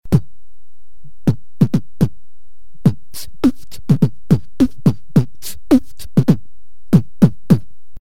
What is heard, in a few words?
kick